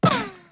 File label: ricochet